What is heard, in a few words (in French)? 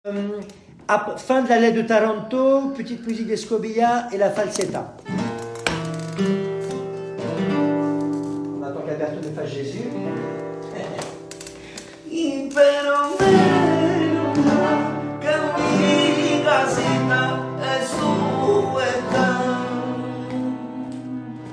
musique escobilla taranto puis falseta, flamenco